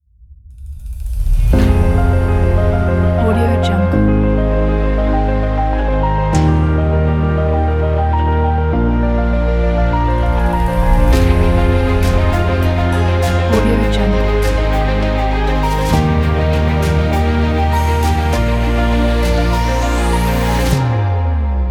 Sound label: the epic